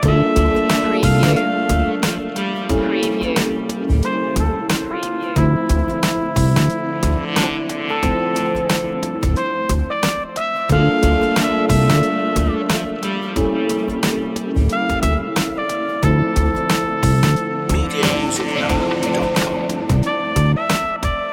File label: the ocean loop